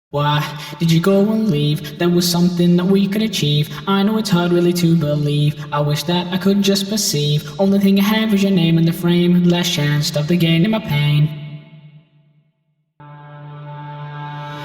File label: Acapella